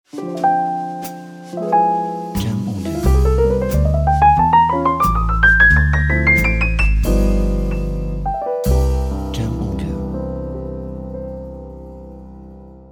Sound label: Jazz Piano Logo